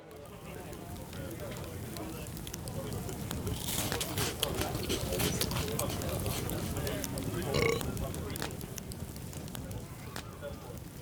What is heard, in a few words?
Crowd Ambience; Pitch Black Audio Pong